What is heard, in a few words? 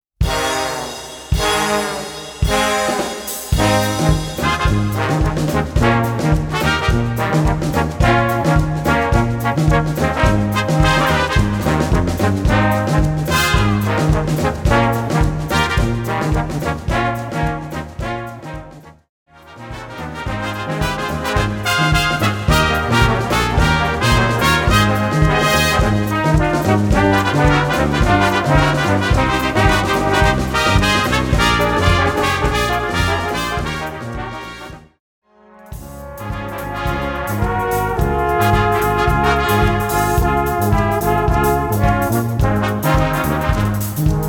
Brass Meeting